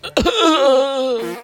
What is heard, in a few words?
male scream